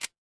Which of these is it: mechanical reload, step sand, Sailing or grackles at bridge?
mechanical reload